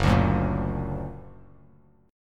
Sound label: F sharp Augmented F sharp Bass Standard; F#+ strummed; 2,5,4,3